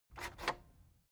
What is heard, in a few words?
cassette eject click fast